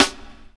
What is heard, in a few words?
airy snare sound C sharp key, steel snare drum sample tuned to the C# note; snare drum samples